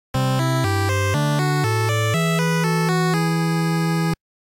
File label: game clear